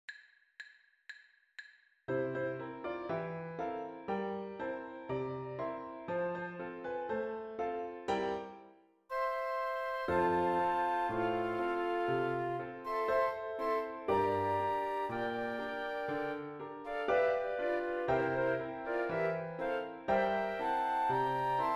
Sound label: ragtime in trio 120bpm; joplin